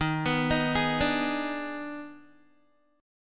Eb Major 7th Suspended 4th Sharp 5th, Guitar, EbM7sus4#5 chord; arpeggiated; 11,14,13,13,14,x